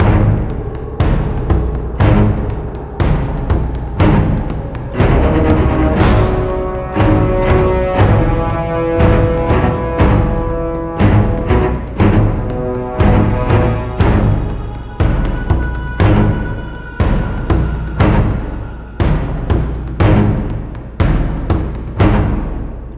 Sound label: Suspense; Prototype